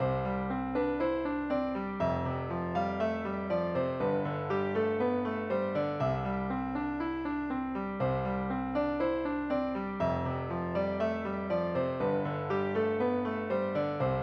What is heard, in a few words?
ballad